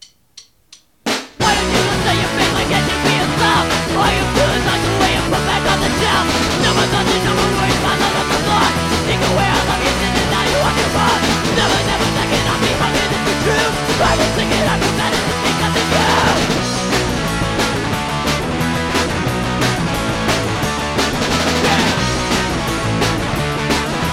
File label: fugue